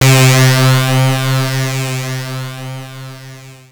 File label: Anasound Long mid C, Analog Single Notes